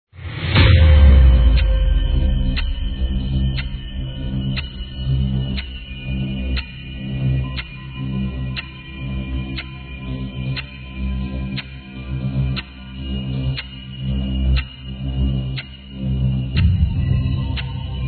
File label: clock ticking buzzer